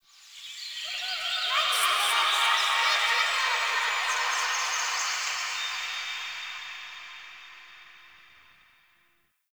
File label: Zariman Park Memory Echoes; Warframe; The New War; Ambience